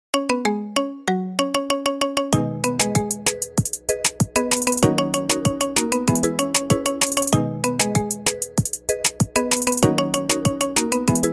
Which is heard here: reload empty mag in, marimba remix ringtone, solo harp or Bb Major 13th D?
marimba remix ringtone